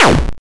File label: damage